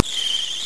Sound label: TIRES